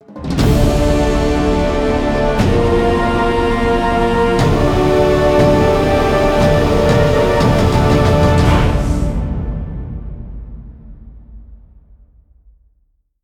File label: Mission Success Epic